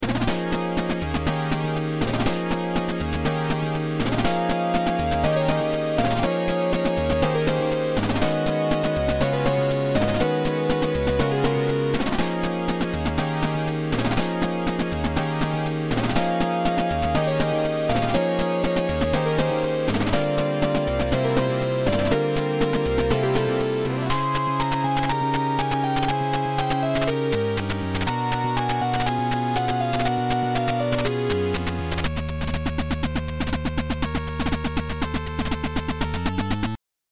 hardly like water; juvenilia

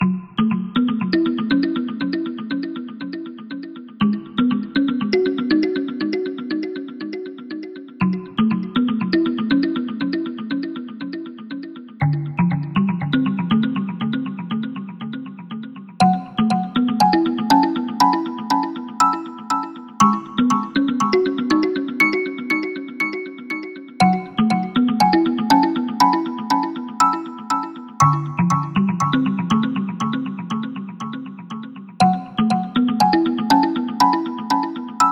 wooden robot

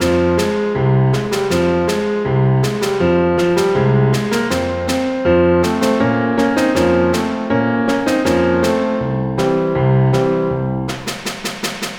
snare dance

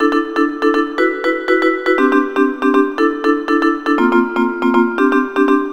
tense, chiptunes

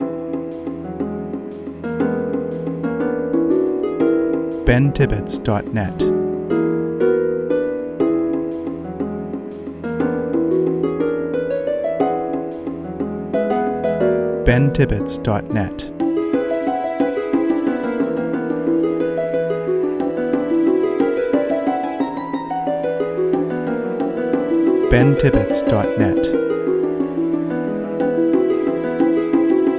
Magical Breeze no flute; everything except the flute; Ben Tibbetts Studio

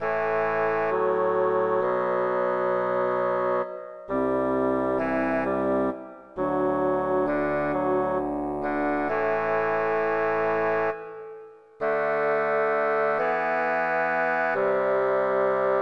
bassoon temple, chiptunes

